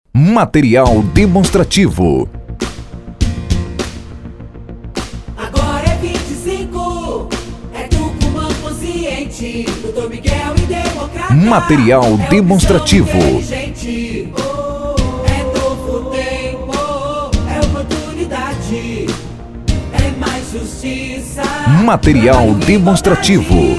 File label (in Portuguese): Jingle